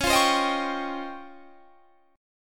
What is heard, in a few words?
Db Major Sharp 11th